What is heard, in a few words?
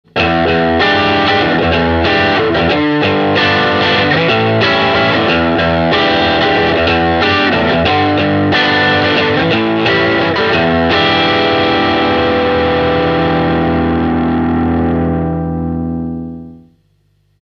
tele voicing7, super champ x; voicing 7 lorgne du coté de chez Vox (AC30); fender super champ x